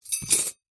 household cutlery handful set down on soft surface; zapsplat